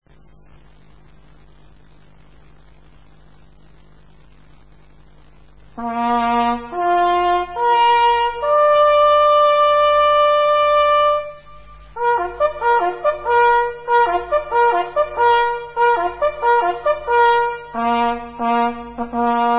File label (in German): anblasen, signale